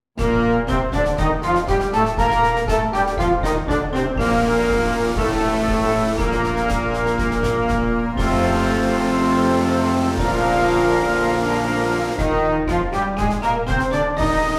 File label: Middle School Extended Scales